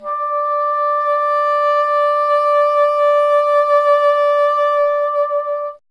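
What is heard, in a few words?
wind bassoon D; Miroslav Vitous - Solo Instruments VOL-1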